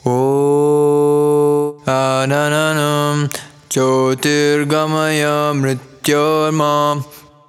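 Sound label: indian vocal loop, 128 bpm, d, arabic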